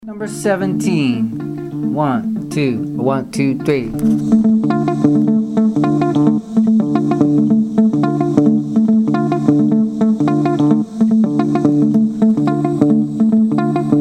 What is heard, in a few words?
mozambique patterns